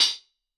Solid Quartz, Hats; Airwindows

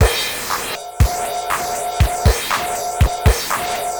Distorted TR 505 (120BPM), beats

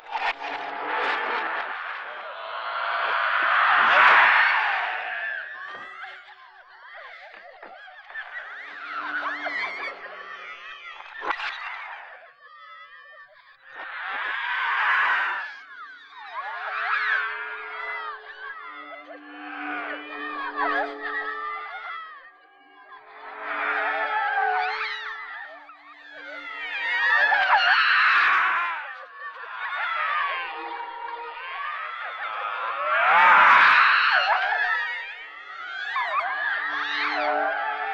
Vocalizer long montage; Sonic Foundry (Sony Creative Software); Ugly Remnants VOL-2; UR 2 FX (Long)

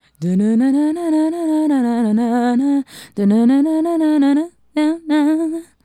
Female Solo, Hip-Hop and RnB Vocals

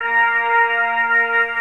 PIPE ORGAN

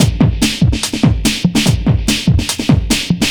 Beat, Futurist Drum & Bass; Drums & Percussion

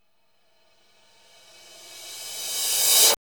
CYMBALS

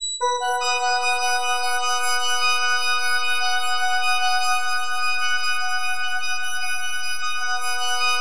FM B MAJ; Bolder Sounds

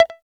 guitar, C guitar

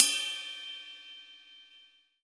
RIDE; Best Service; Alpine Volksmusik

